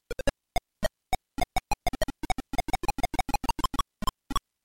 Glitch Intro

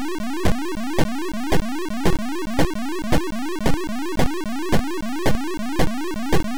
Flying Saucer

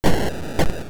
Firing

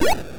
Enemy Dying

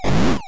Collecting bonus

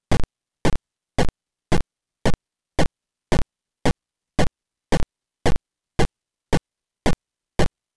Marching

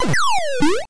Dying

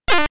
Firing

